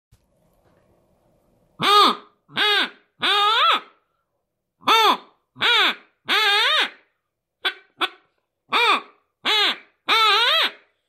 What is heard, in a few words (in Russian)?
морянка